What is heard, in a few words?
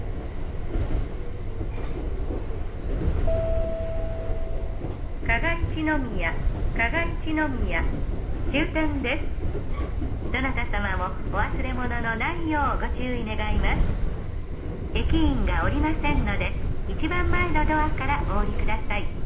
train; hokutetsu ichinomiya